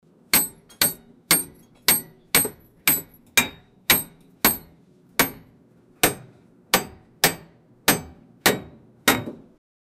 metal tools banging, ratcheting

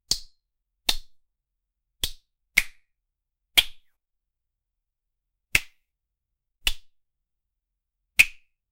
tiny mwah or lip smack

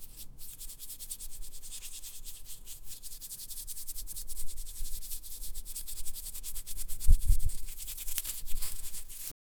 soft sprinkling sound of; smearing

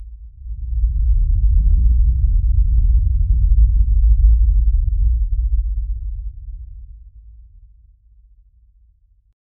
mid level rumble of the u; universe

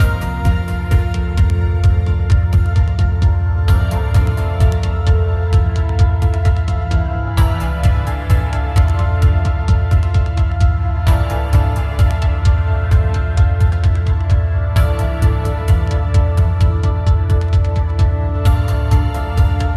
create looping ambient mu, menu

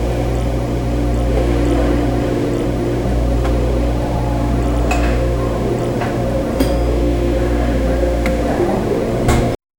coffee shop ambience gent

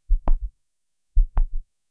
the sound of walking on, character movement

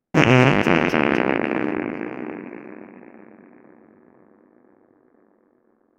cartoon fart sound; silly

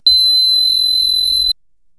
futuristic ai activation

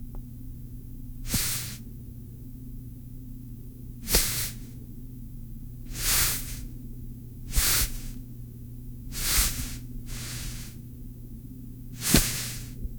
close asmr of hands combi, textures

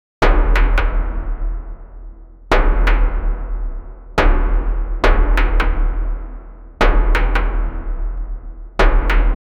create an epic desert sou, desert

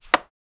a single playing card, tabletop

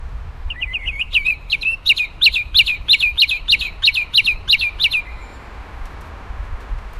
bird singing in middle of

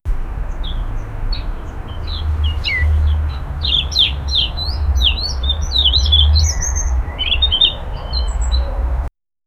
a bird is singing

